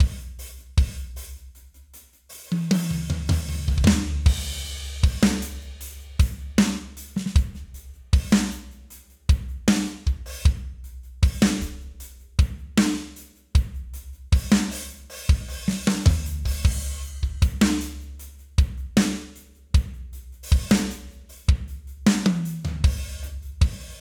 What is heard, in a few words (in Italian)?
batteria non processata